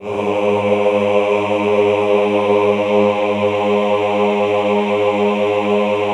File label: AHH G#1, Classical Choir; Best Service; Extended Classical Choir; AHH LO+HI LL